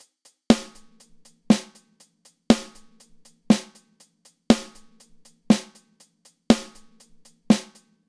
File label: Snare HH Balanced